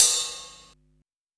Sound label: Ride set, Drum and Bass; Ride Set 1; Instrument Samples